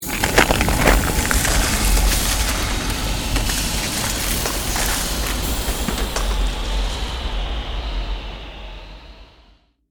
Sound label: CREATED MAGIC SPELL BLIZZARD SHARDS; A series of magic or arcane spell sounds designed to suit fantasy themes, Arcane,Created,Fantasy Magic,Ice Magic